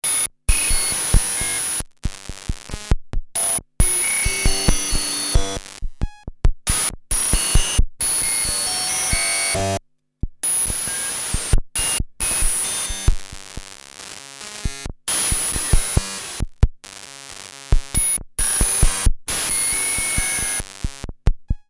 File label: korg ds-8 sounds, error sequence